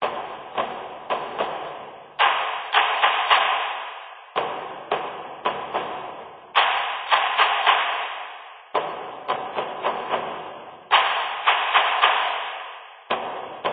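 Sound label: Music Ambient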